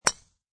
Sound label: Golf Driver, High quality stereo recording of a golf drive; Golf (Sound Effects:Sports)